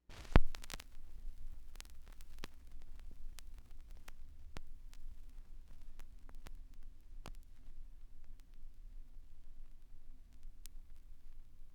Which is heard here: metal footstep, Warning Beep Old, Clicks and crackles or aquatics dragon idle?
Clicks and crackles